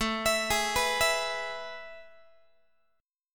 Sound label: A Major 7th Suspended 2nd, Guitar; AM7sus2; arpeggiated